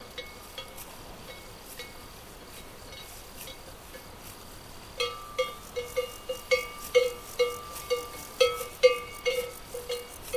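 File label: Cow Cowbell Movement Wind; cows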